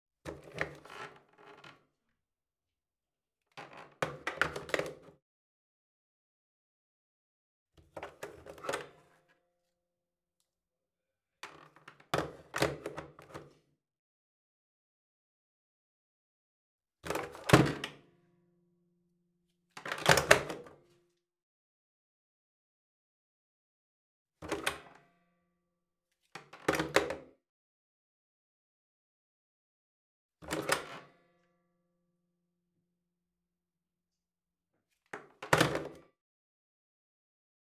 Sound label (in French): TELEPHONE FILAIRE PLASTIQUE VIEUX COMBINE DECROCHE RACCROCHE MANIPULATION MAIN; MAINS; Mono; etiennebelliere